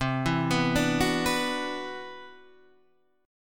C Major Sharp 11th, Guitar Half Step Down; CM#11

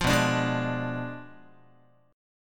B Diminished 7th B down Guitar; Bdim7 strummed

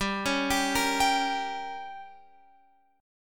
G Diminished, Guitar, Open F; arpeggiated; JGuitar

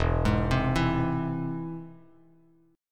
E Augmented, Bass Standard; E+ arpeggiated; JGuitar

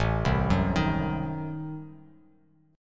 A Diminished 7th A down Bass Standard; Adim7 arpeggiated; JGuitar

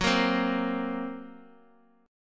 Gb Major Flat 5th

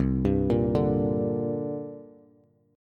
C 7th Suspended 2nd down Bass; C7sus2 arpeggiated; JGuitar

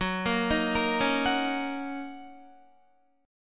Fsharp 6th down Guitar; F#6; arpeggiated

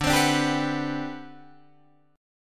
D 13th D, down, Guitar, Drop D

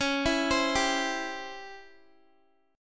Db Minor Major 7th Flat 5th, Guitar; x,16,16,17,19,x; 4F,4A,5C,5G,5C,6E; arpeggiated

